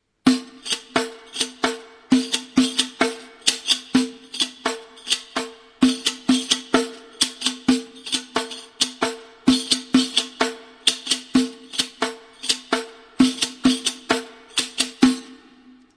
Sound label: riq